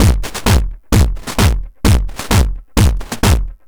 Crushed Beat 130BPM; musicradar

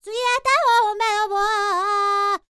Vocal Planet World Voices, NOMADIC

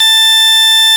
FARFISA, ORGAN; Sonic Reality; Vintage Timetraveler

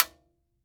TIMBA, TIMBALES

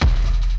Bass Drum Rattle; Lost Tapes From Mars

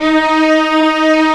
string, cello orch; Roland LCDP13 String Sections; STR Combos 1

